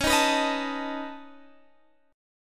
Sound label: Db 7th Suspended 2nd Sharp 5th Db down Guitar Standard; Db7sus2#5 strummed; JGuitar